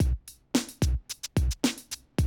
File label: Classic DrumLoops, 110